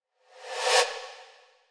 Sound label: Reverse Air; Cymatics; Astro FX 4